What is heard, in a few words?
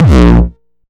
909 Dist Reso - A#1, Kicks; Mbase 11 Kicks